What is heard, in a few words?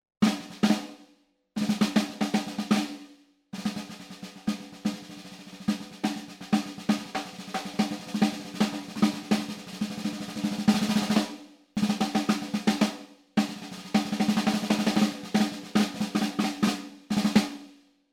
SNARE 14˝x 5 5˝; Fame Fortress